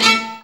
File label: VIOLIN HITS; Best Service; World Colours